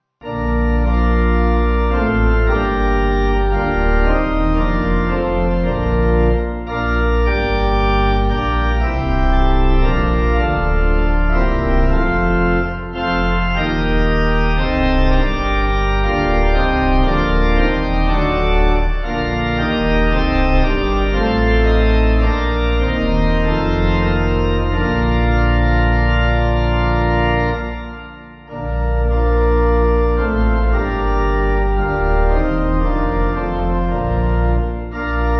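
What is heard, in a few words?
Pipe Organ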